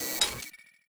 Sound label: nut down